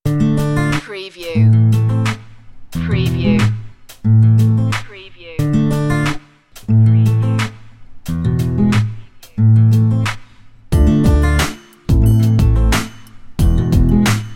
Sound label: hip hop soul underscore